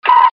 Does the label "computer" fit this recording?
Yes